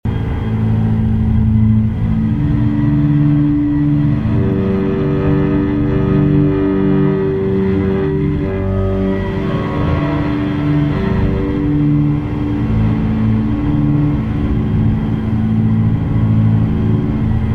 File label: Ambience Sinister Electric Cello Loop; gamerip; eternal edge